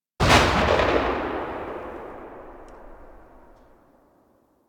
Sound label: Flak Cannon Tail; Grineer